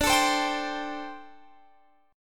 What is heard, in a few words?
D 7th Suspended 4th D Guitar Standard; strummed; capo=2